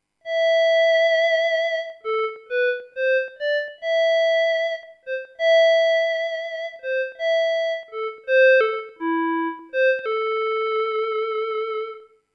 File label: Recorder plain